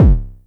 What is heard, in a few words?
909-ish Kicks, Raw Kicks; LIMAT Div Dept; Drums